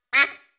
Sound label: quack; Animal Sounds; Various Animals; Short calls